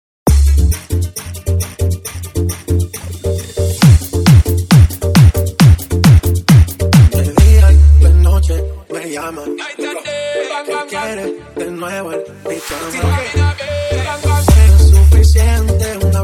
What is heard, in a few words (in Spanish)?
reggaeton